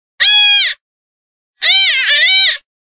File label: Whitetail Fawn